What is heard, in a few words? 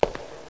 tile